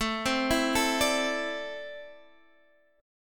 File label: C 6th Add 9th A